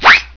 TOON

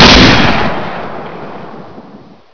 Garand shoot

